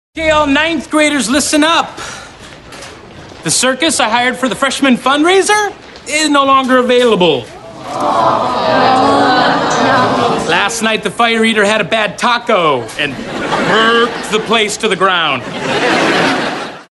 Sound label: circus